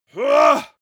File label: male groan strain